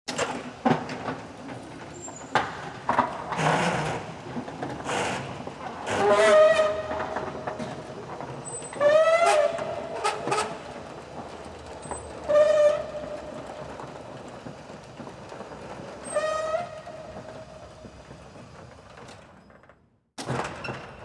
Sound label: metal noise